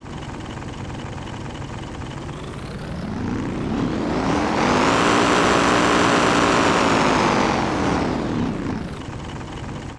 offroad engine sound

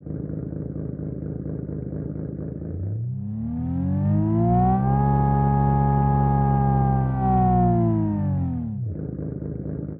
i6 japanese ext, engine sound

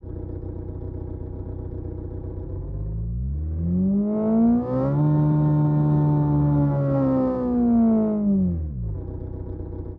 i4 serbian ext engine, engine sound

